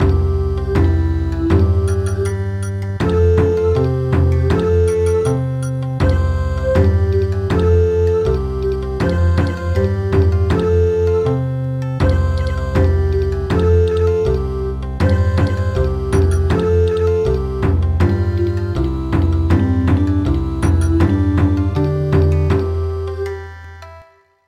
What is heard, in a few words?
Bon Odori